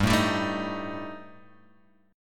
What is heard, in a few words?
G Suspended 2nd Sharp 5th, Guitar Open G